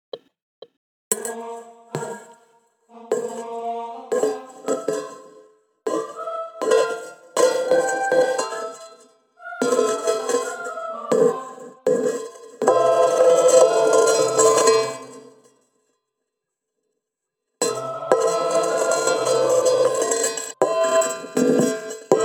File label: Vc Backing